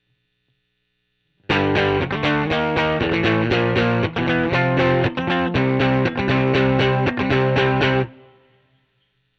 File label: GTR OD